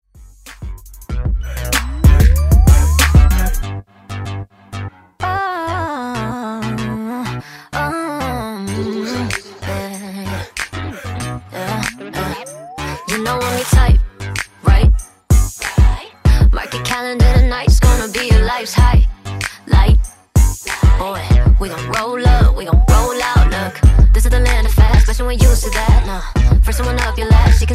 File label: RNB Mashup 95 Bpm